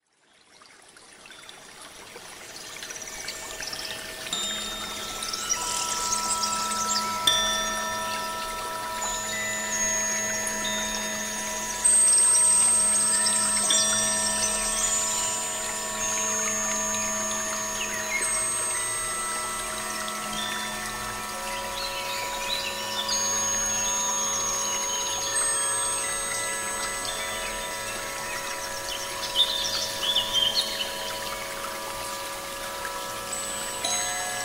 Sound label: Wind chimes and birds